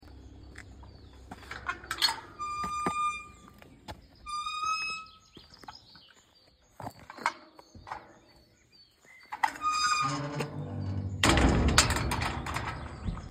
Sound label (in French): entree, BRUITS DE PORTAILS; LONDIGNY (16)